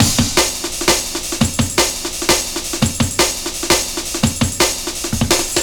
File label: Swift Beat, Drum & Bass, Beats & Breaks